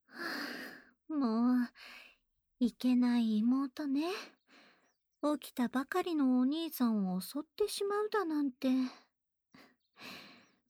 お耳ふーふー; もどかしさいっぱいの幸福を教えます

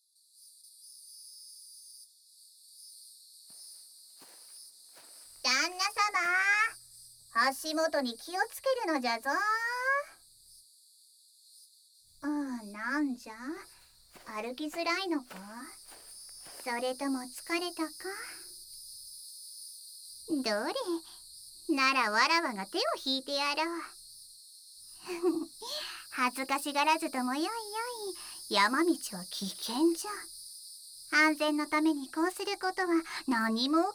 清流のほとりで; SEありver

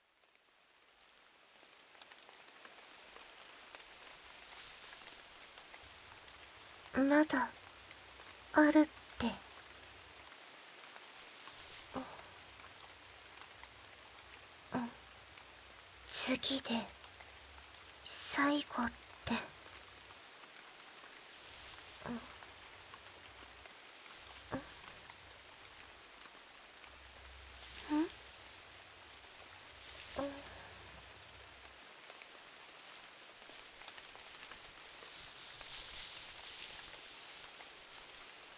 雨降り, 環境音; ASMR; 杜の神と傀儡少女; 環境音vol下げバージョン; 雨降りバージョン

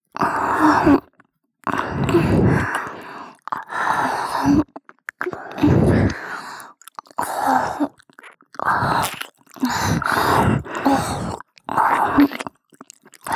両耳 いりぐちちろちろ、あまがみはむはむ

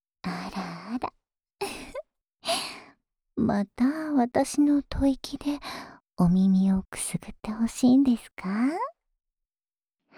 安眠用お耳ふーふー（左側）

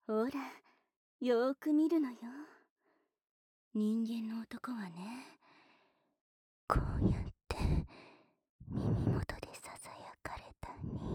サキュバス母娘（両耳舐め）

